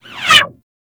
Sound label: Skiff Bullet By; Grineer; Arch Skiff